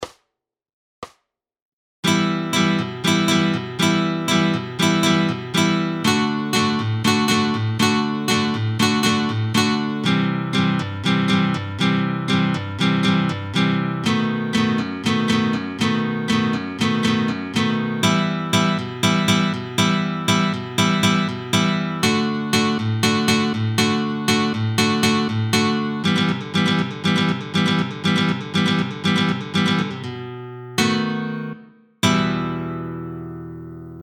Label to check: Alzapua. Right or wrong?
Right